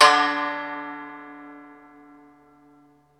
SU GZHENG, Earth Tones

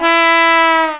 LO FALL, TRUMPS BASS; Zero-G - Funky Elements

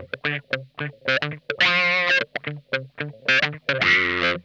Funk Guitar; Zero G - Funk Guitar

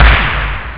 Snare Drum; ST-XX Amiga Soundtracker Sample Packs

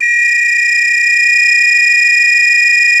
2 NOTE WHIS, World Whistles

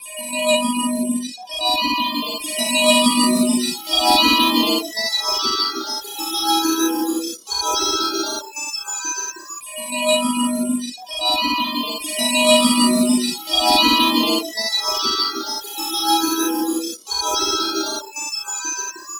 Filter Mass Melodic, Tonal Atmospheres; Sonic Foundry (Sony Creative Software); Myths of Technology

